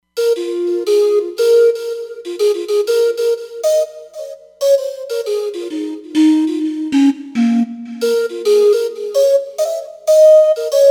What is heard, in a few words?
Chifferkla, Roland Fantom XR